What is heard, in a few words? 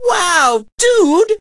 hurt